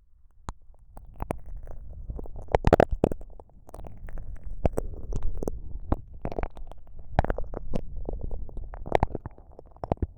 KU100 右耳 奥を自由に舐められる

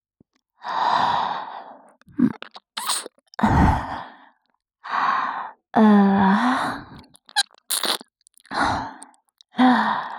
左耳舐め (ループ)

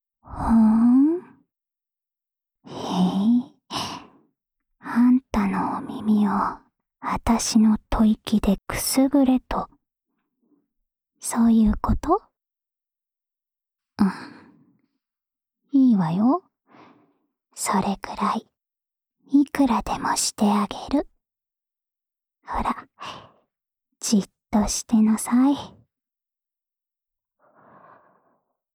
安眠用お耳ふーふーループ（右耳）, ASMR; 桐那の安眠用お耳ふーふーループ（右耳）

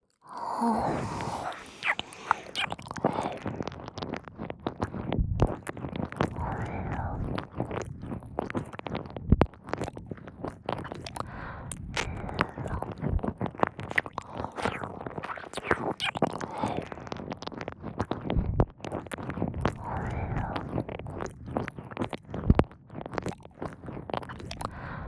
耳舐め

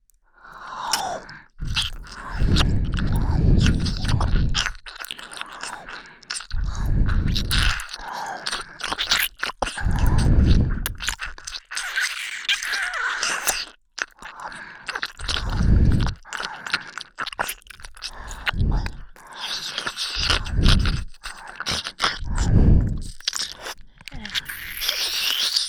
二人で耳舐め

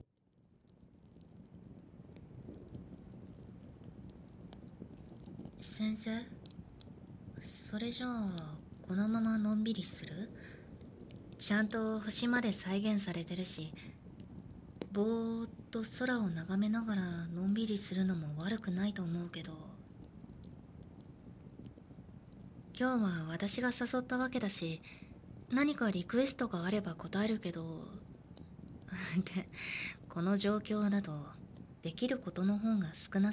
焚火を見ながら, 耳かき, ASMR; 「……焚火を見ながらされたいことがある？ なに？」

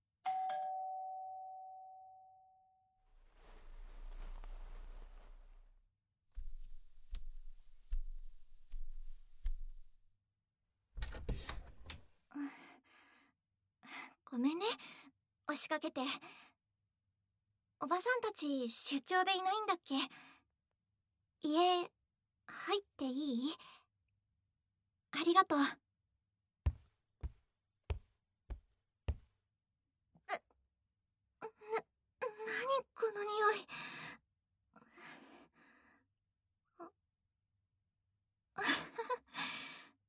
耳舐めぐちゅぐちゅ; 嫉妬に狂った姫奈に押し倒され上書き耳舐めぐちゅぐちゅ手コキ

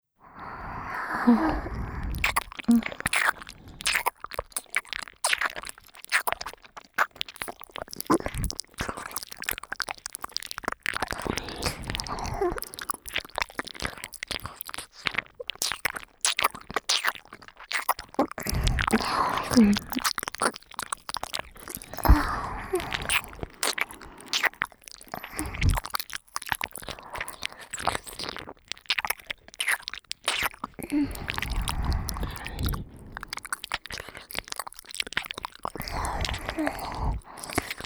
耳奥W舐め犯し

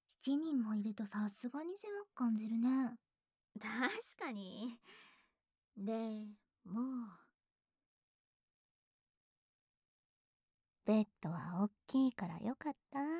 両耳舐めサンド, ASMR

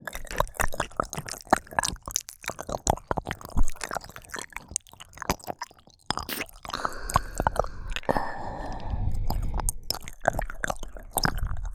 二人で耳舐め; 二人に犯され

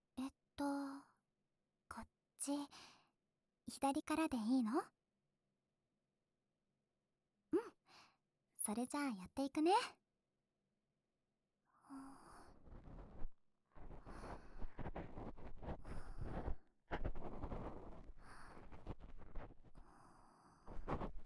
耳かきで癒してあげる(左耳)

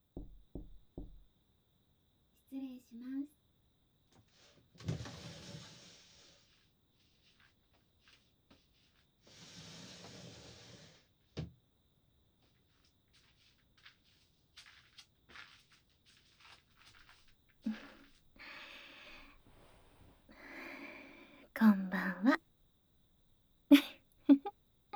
オイルマッサージ素股＆耳奥舐め; 『伊武 ゆづる』オイルマッサージ素股＆耳奥舐め犯しえっち

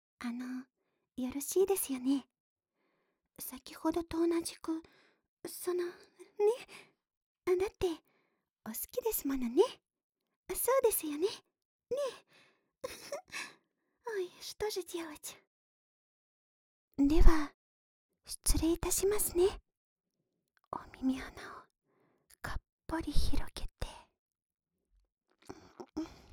【左】唾垂らし＆浅めゆっくり綿棒, 耳かき, ASMR

